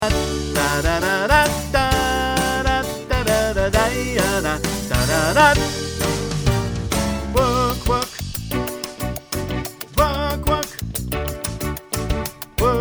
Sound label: tenor